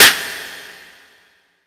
clap

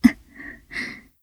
gasp; moeka

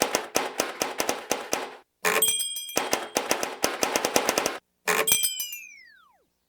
typewriter w tapestop fx

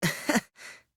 hehe

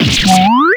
damage; sorin

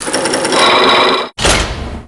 lock start

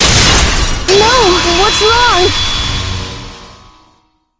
cross swords; chihiro nanami

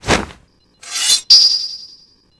draw

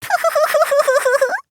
puhuhuhuhuhu; monaca

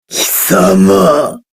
angry

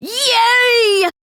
"yay"; jataro

